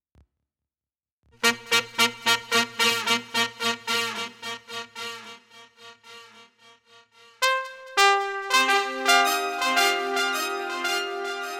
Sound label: Medley Fanfare